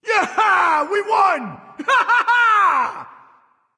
yay; smite; voicepack; ullr

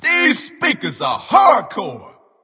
ward placed; smite; ravana

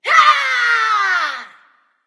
battle cry; Smite; athena

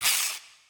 armor; smite; arachne skin9

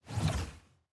giant run cloth; smite; vamana

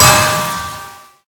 hit silence; smite; thanatos

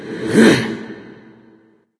grunt attack; smite; ahmuzencab

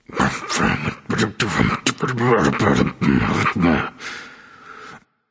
grumbling; smite; voicepack

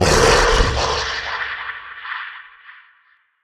summon; smite; scylla

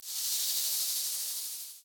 snake hiss; smite; medusa

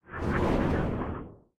float; smite; thanatos

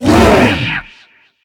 charge; Smite; Tiamat

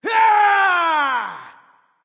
battle cry; smite; loki

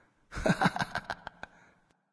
Lobby Minion Laugh; smite; kumbhakarna

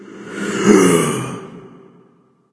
breath; smite; ahmuzencab

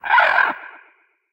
phoenix base chirp; smite; npc

